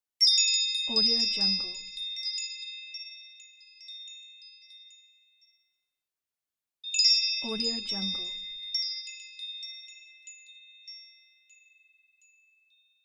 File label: door chimes